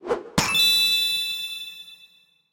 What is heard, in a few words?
random Wilds Fall Down; finn